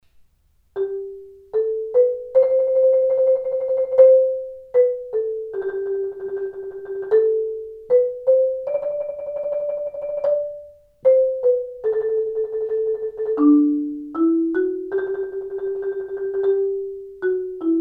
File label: mallets